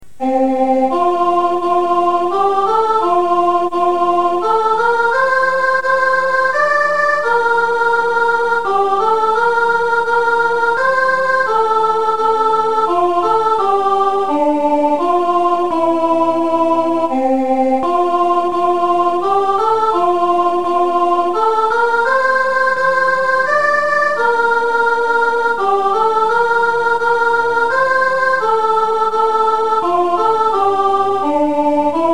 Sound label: away in a manger sop; Christmas; carol